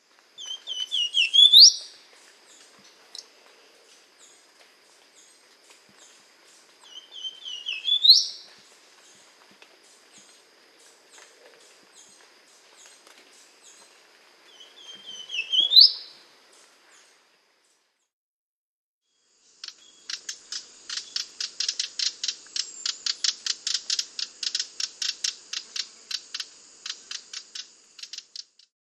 Golden crowned Warbler

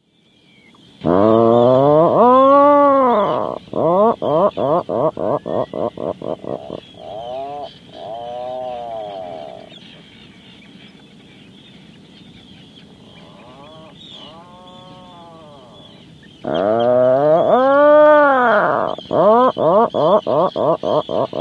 Atlantic Puffin

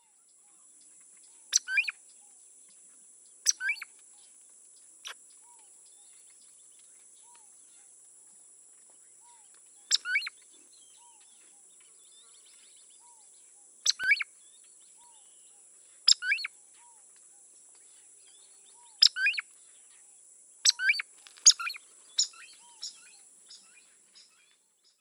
Groove billed Ani

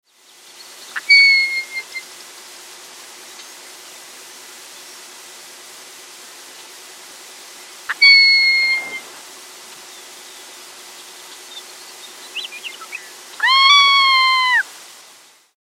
Ferruginous Hawk

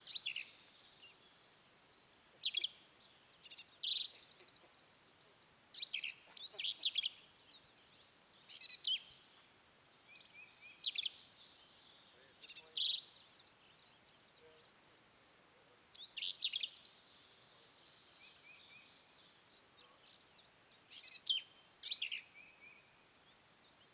Black capped Vireo, Vireo atricapilla

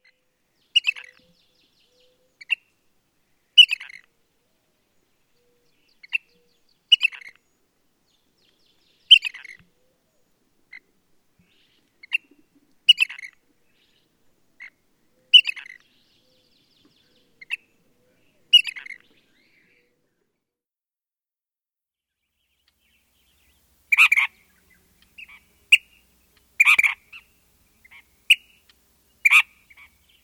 Ash throated Flycatcher

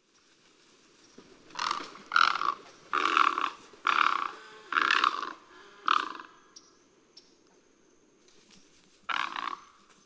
Great Egret

